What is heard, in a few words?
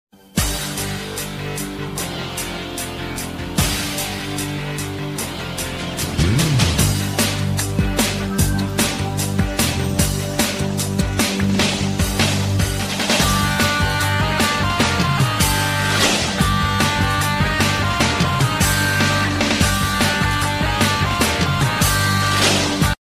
bgm music